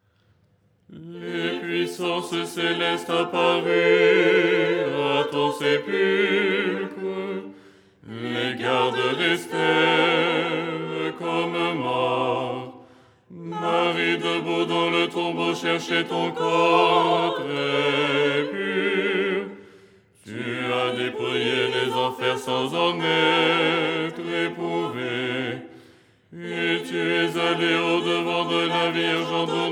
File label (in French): basse; Tropaire du dimanche; Les 8 tons en français; ton 6